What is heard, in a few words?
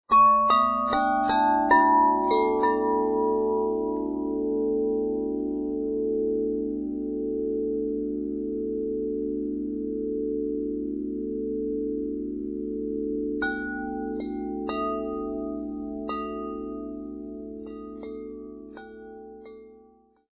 pent bass